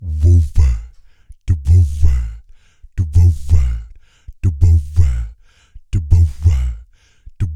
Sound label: DA WHOA WAH, Vocal Blues Soul; Spectrasonics; Planet